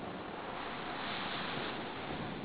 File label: waves; scuba